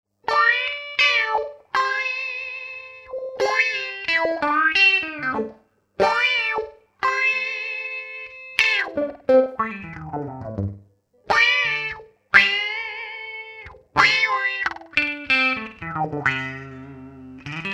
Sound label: Bath time